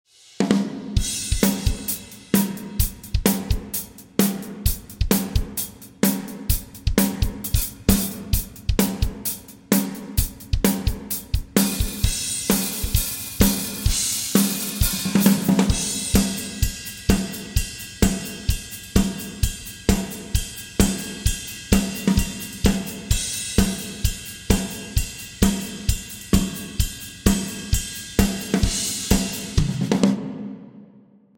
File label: Drums; Alitverb; Saint Quen